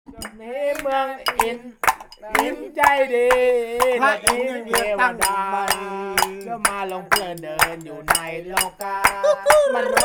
Banana plantation celebration, Thailand; Les Cartes Postales Sonores; NOISE ON EARTH Vol3

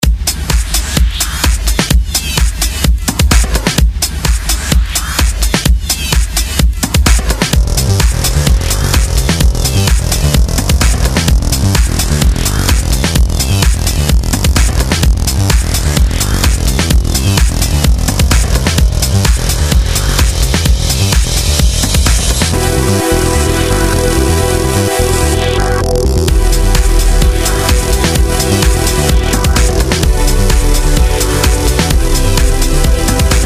Storm

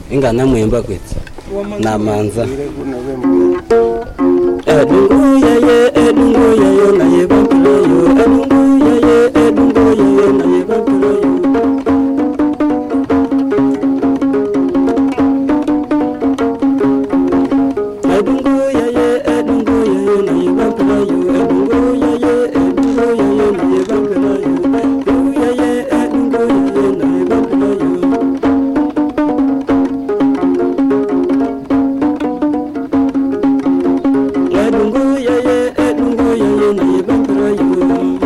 adungu tune; Kilembe Uganda

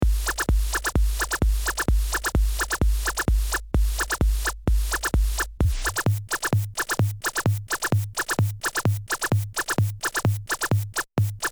129 beat with a double weep; Loop Mania Sampler Pack

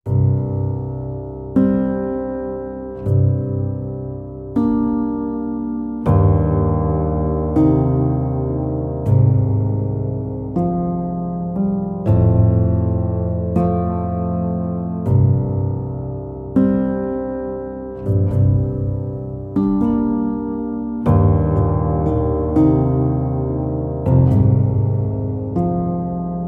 Slow gentle solo piano; CUES for Film TV Games etc SOLO PIANO - or mostly piano

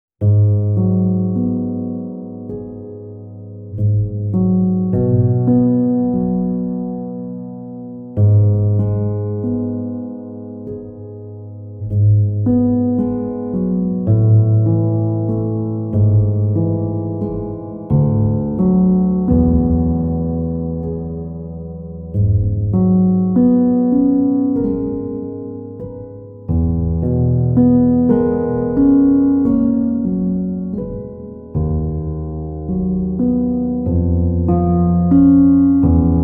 Sad Loss Simple Gentle Solo piano; CUES for Film TV Games etc SOLO PIANO

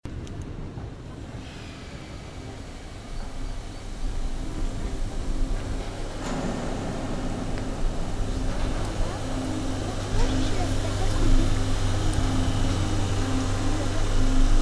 Imaginary Soundscape

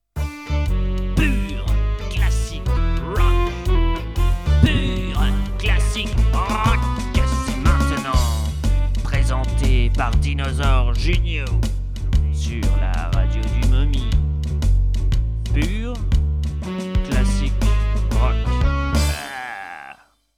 pur classik rock jingle; Jingles la radio du MOMI

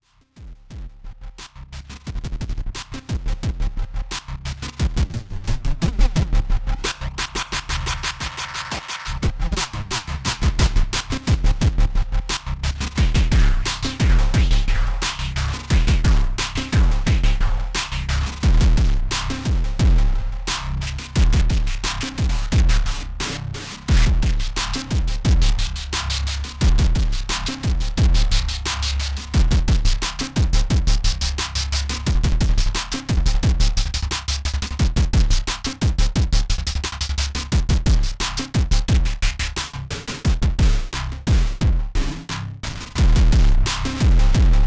Industrial slower epic lurching 88bpm; CUES for film TV games etc

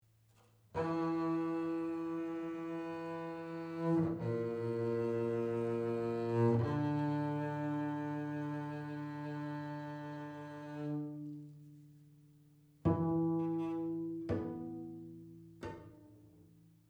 Prepared Double Bass

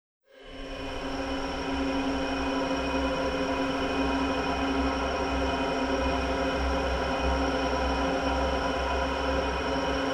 Resonate noise

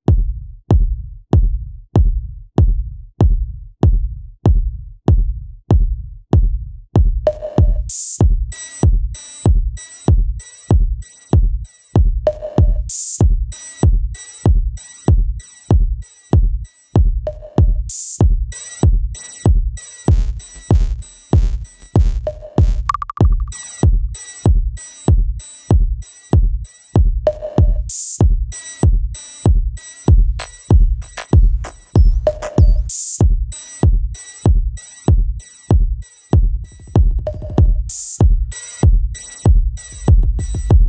Minimal Electronic Machine Noise; CUES for film TV games etc

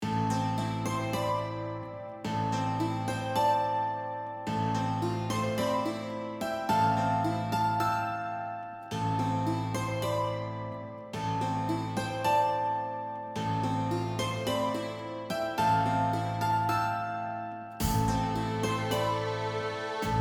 with Drums

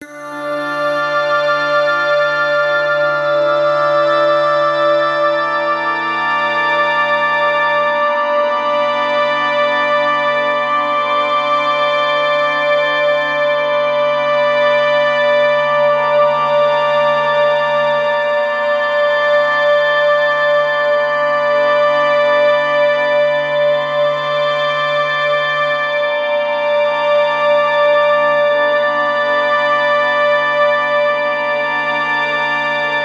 Ghostly Spooky Building Synth only; CUES for film TV games etc; DARK

